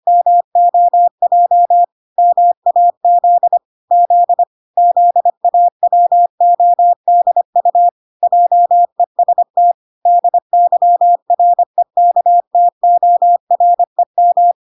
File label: 25wpm